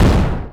explosion; epiphany

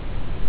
test tone; libaudio-wav-perl

